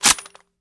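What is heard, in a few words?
reload empty drum charge, shotgun; gmod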